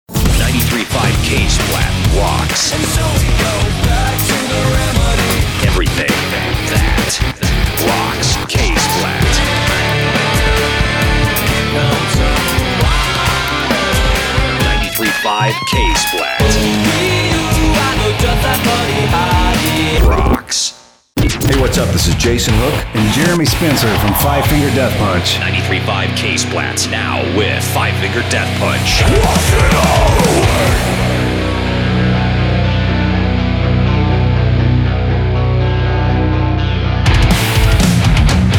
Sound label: rock splat beatmixes and song intros